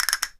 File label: CASTANET; ILIO; Synclavier Percussion 2; World & Orchestral